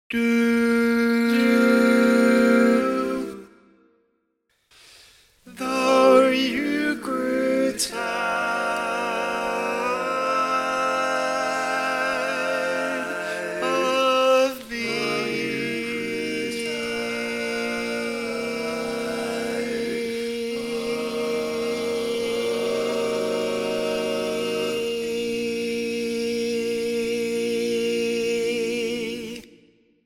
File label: Lead, barbershop